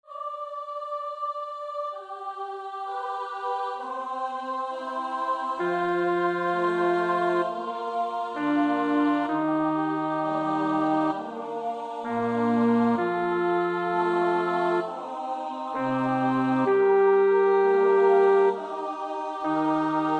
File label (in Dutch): bas, koorpartij oefening; Schütz (Heinrich); Matthäus Passion SWV 479